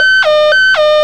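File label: power plant alarm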